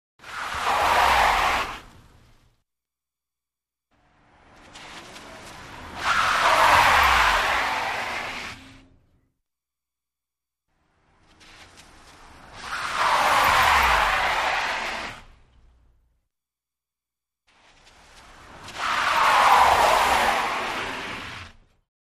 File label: Wet Skids On Smooth, wet tires